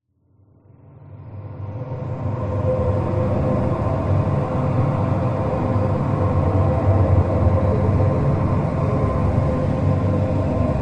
Otherworld Flat Nether Passage Dark Spacious Trickling Eerie Drone Flowing; Underwater